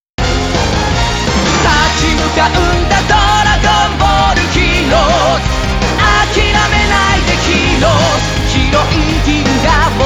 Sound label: bgm loop; Super Dragon Ball Heroes World Mission